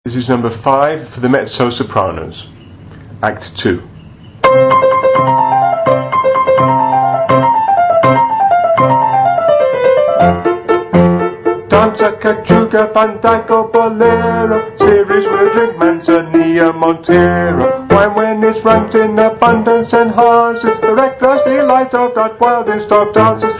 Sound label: mezzo; gondoliers